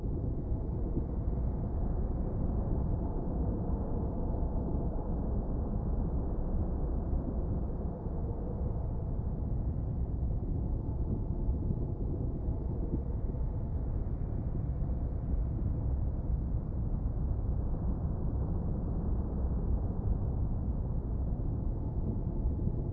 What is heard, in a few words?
swamp, lake